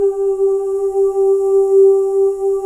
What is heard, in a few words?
BGV OO; Spectrasonics; Symphony of Voices; BGV OOS 2